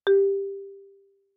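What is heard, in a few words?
Marimba cord fortissimo G4; MIS Pitches - 2014; Percussion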